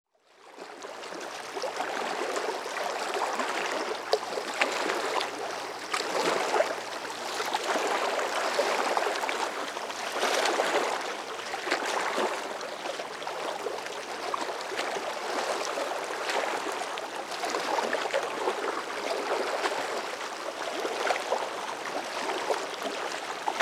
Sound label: White Noise